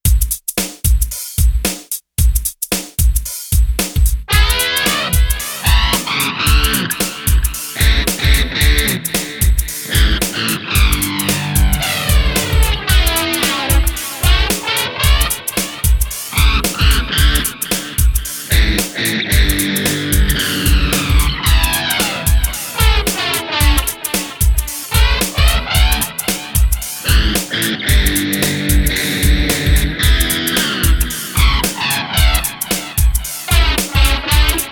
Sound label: dance uk 90s flanger echo; vox